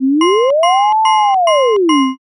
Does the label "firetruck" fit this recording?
Yes